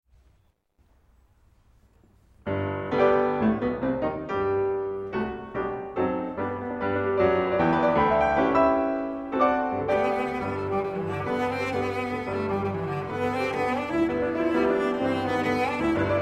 Sonata for Cello and Piano in G Minor IV Allegro mosso LIVE; Rachmaninoff; Op19